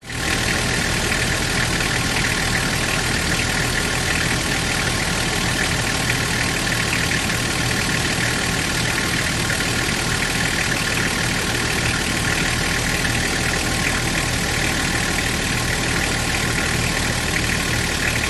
vehicles car diesel engine idle close under engine nissan patrol 1998